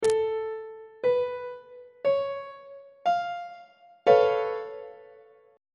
c sharp augmented 7th 2nd inversion on treble clef